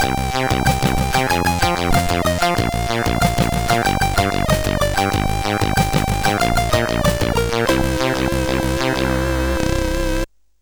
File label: A Game Over Tune, CSG8580R5